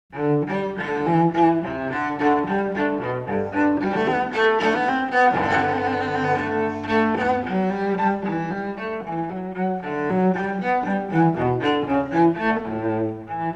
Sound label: cello suite in G gigue; johann sebastian bach; bwv 1007